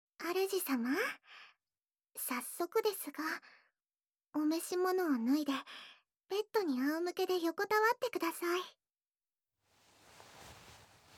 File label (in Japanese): 癒し の 耳舐め 太もも 素股 ご奉仕; SE有